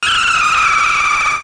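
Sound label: sharp sound of a brake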